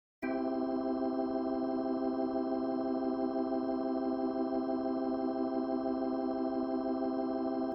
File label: organ chords full model output fast